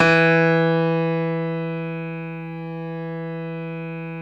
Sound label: StereoGrand, Keyboards